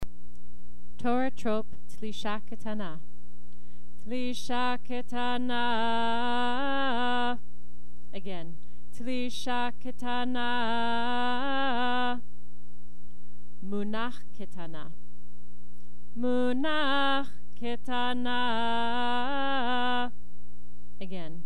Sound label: Trope Telisha Ketana